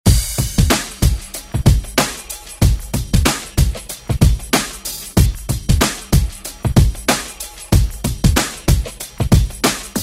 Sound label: Party Hype Redrum; Deejay Hit